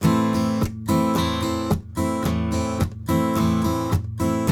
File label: RIFF, Blues Guitar; ILIO; Fretworks